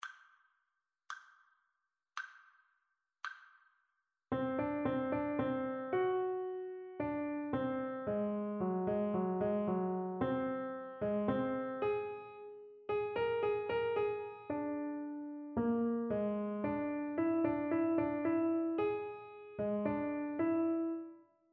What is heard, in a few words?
melodic dictation; ear training